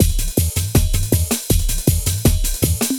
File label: LOOP, Eurotech; Sounds Good